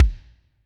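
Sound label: Kick Ambient Tronic, Alesis D4; Kik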